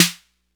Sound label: THE 808, ROLAND, DRUMS + PERC; Rubber Chicken Software Sample Bank